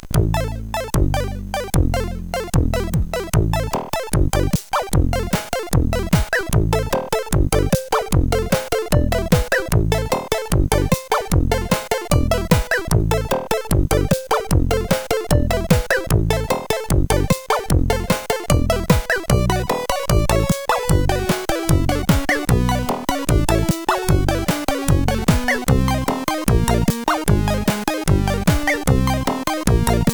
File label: Speed Music, CSG8580R5